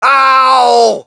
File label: crowd, shout; Mike Tyson Heavyweight Boxing